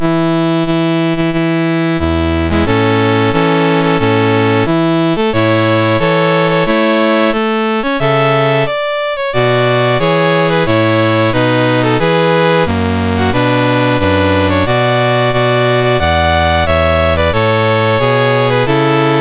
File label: Syria, National Anthems, MOS6581R4; Dave Marquis